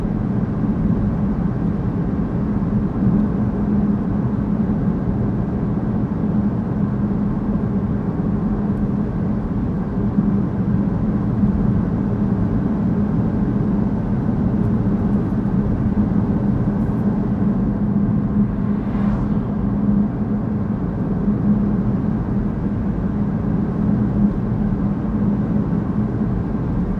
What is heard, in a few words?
electric car; OpenValley